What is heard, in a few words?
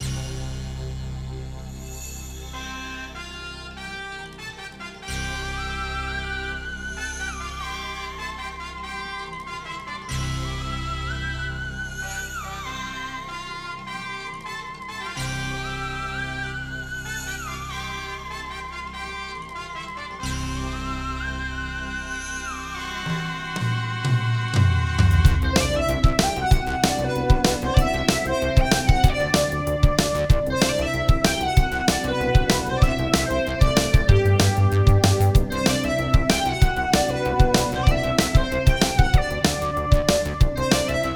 SRX; Space Quest 3 – Theme